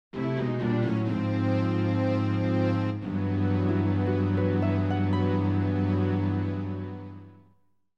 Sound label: Game Over; Legend of Zelda Ocarina of Time